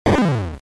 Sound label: End Audio Death; Google Snake